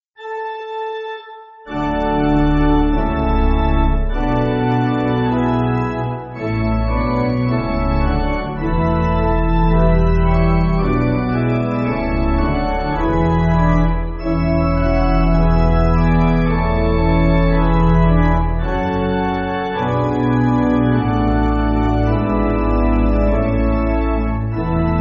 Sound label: Sevenfold Amen Pipe, Pipe Organ, Music Backing Files for Churches